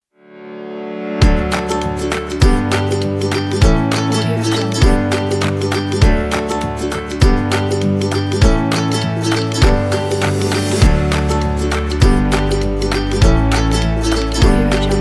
the funny

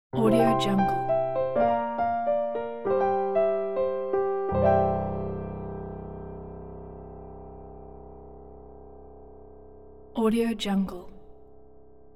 piano splash logo

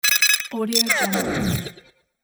futuristic device activation

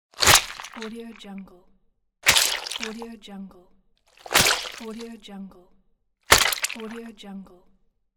mortal wound; audio therapy

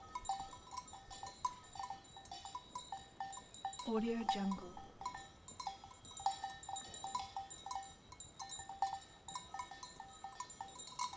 cowbells on cows far

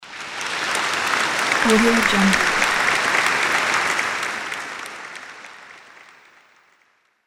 short applause

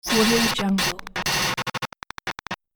glitched tech break; sonic-boom

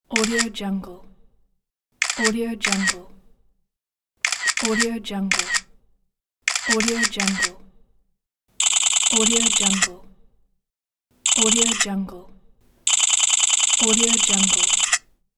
camera

